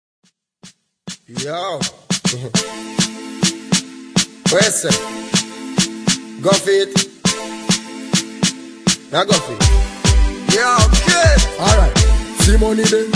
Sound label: riddim